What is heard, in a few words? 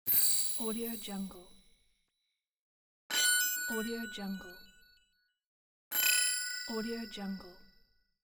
notification